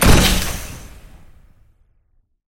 rifle

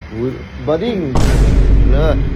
baaaaading

